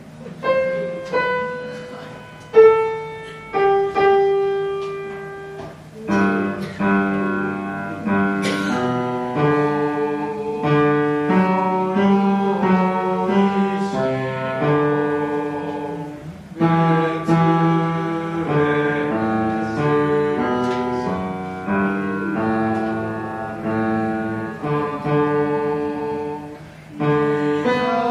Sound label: bass; バス